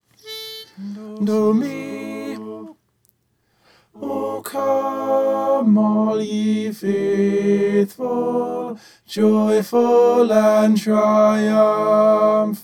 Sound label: tenor